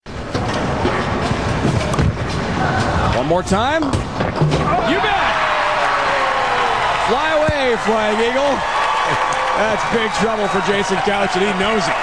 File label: Bowling